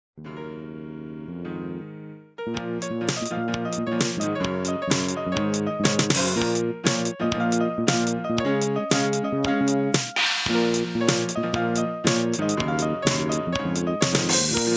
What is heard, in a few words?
village; tarlite